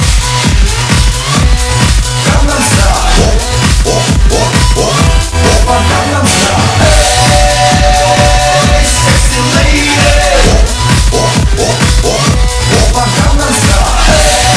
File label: Cave